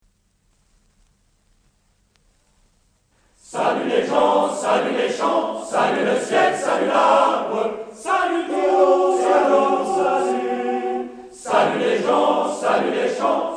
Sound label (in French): Chant de Karad